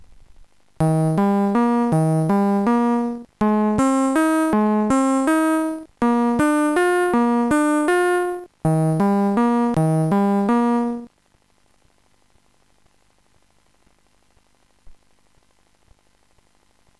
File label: bass; Sonix Music Driver